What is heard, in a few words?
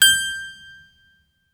harpsi G6; HPSCHD 4